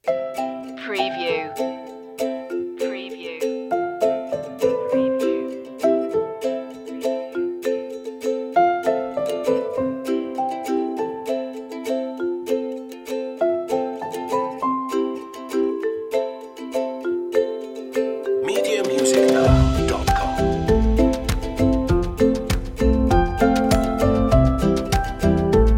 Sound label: ukulele weekend no vocals